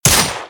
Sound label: pistol shot